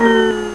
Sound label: drain; GAMECUBE; SUPERMARIOSUNSHINE